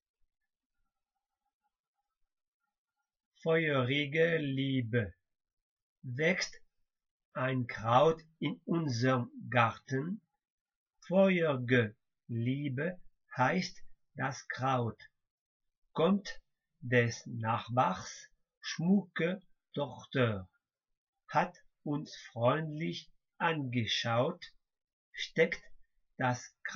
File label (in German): Feurige liebe vocal